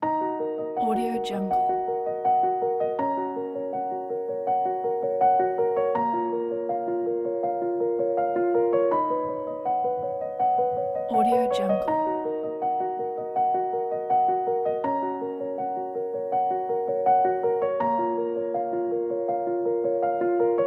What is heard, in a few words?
emotional cinematic